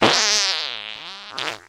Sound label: fart long